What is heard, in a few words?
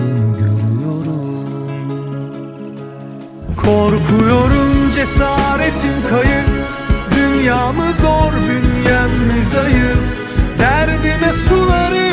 Ringtone Mobile